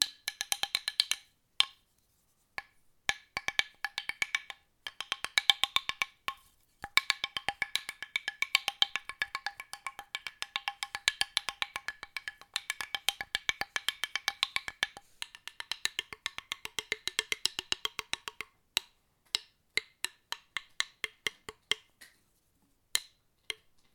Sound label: Fast Sticks